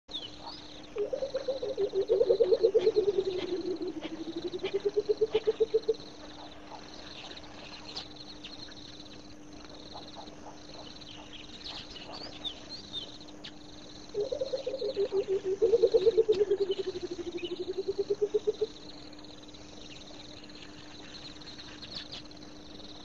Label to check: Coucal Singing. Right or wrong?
Right